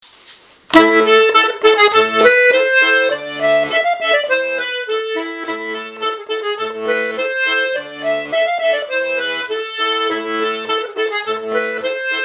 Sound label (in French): Rondeau 8 diato; Trignac